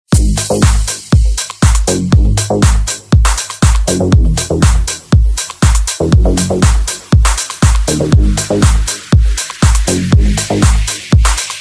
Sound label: electronic